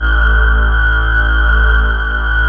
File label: SYN TEKKK, Dance Synth; Sound & Vision - Gigapack I CD 2